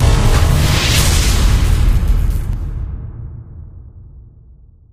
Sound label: boom; faithless; minecraft; ploof-pack